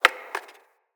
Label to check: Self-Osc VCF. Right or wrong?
Wrong